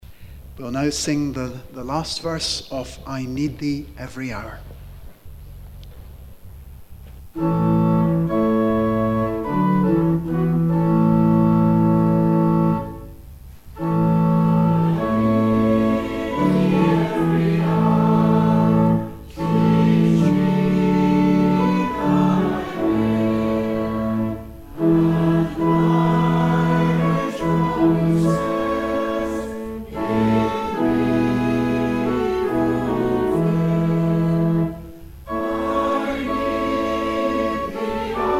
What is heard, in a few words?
hymn v4 and blessing; Hymn 556 - I need thee every hour (v4) & Blessing